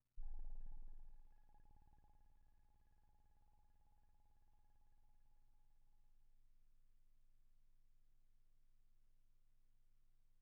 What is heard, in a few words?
bead